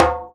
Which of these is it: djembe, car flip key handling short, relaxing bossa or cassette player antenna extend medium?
djembe